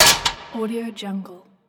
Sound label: metal hit